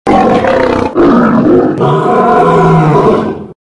lion voice sms tune

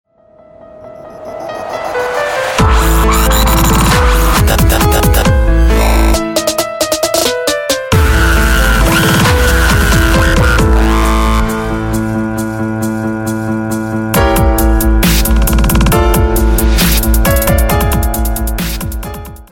piano dubstep

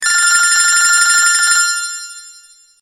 black berry old phone notification

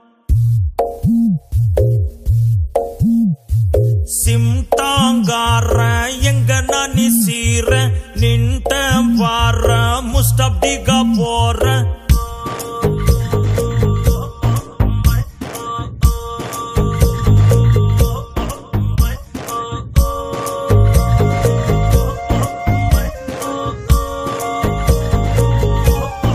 telugu tone

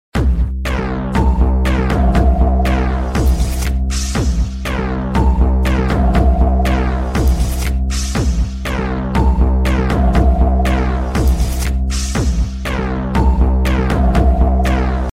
electro chimes for nokia

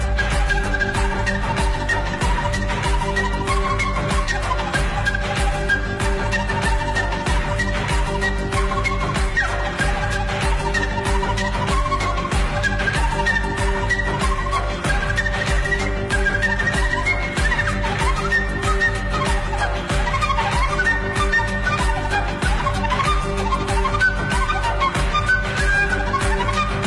fast fluit

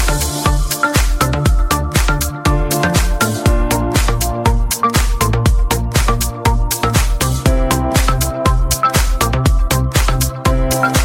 cool mix

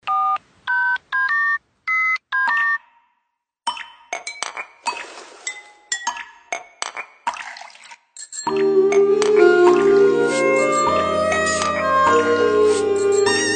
national anthem music pakistani

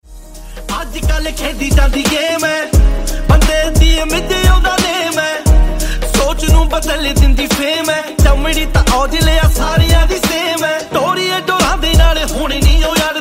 punjabi

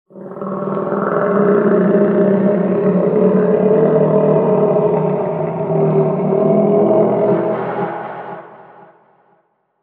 monster growl

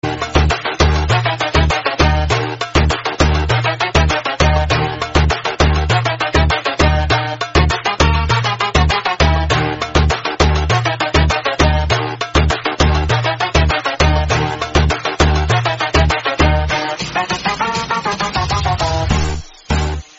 arabic trap

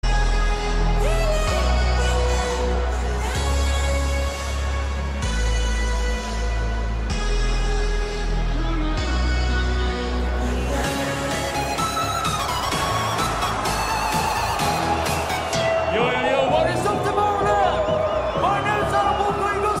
trance concert; tomorrowland

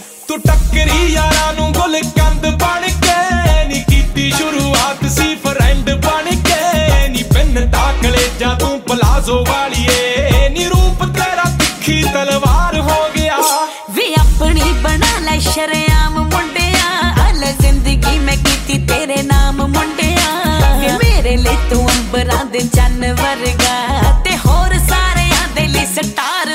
punjabi

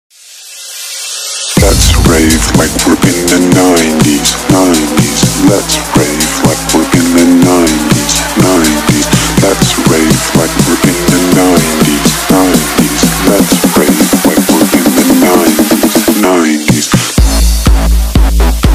electro, ringtone

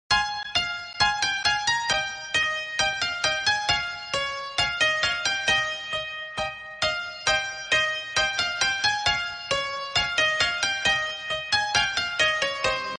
turkish melodi, ringtones

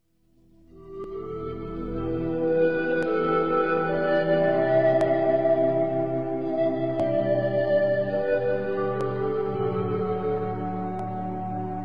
celtic pan flute pan seaside

